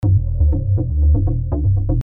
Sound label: loop